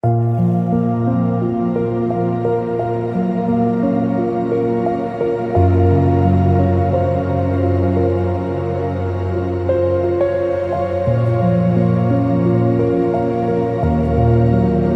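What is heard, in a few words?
Fading, ambient piano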